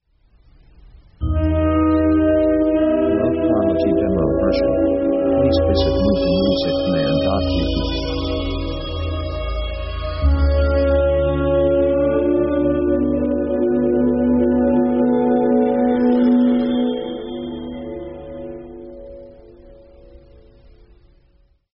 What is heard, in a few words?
black city